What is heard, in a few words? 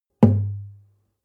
nylon guitar drum single tonal bass